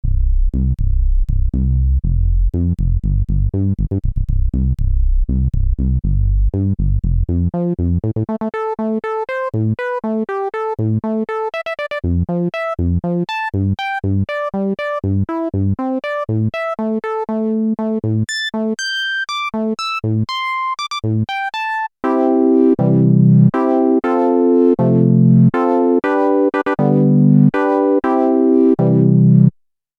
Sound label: waldorf microwave xt filter lpfsinx q075